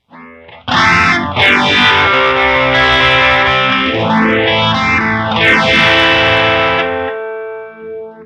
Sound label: Phaser Shallow; Instant Phaser